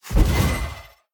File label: Ghost Strafe; The Town